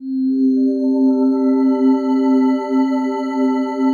digi pad, Pads

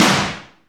SD BONUS, Drums

